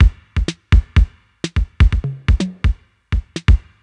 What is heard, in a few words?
drum loop chit stripped 125, Classic Chicago House; Sample Magic; Drum Loops